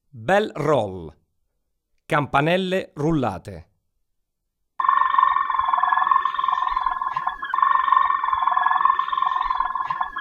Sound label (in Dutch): Belrol, waterslager